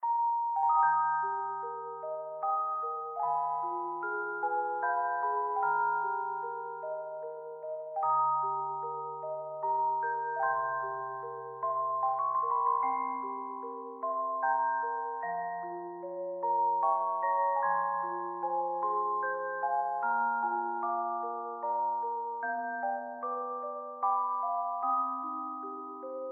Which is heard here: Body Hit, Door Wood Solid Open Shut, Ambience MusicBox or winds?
Ambience MusicBox